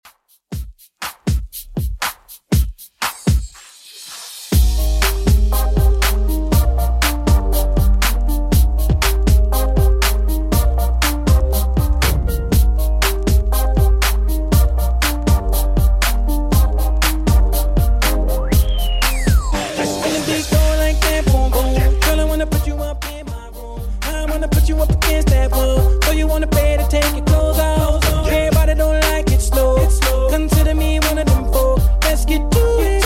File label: 2ks Redrum 120bpm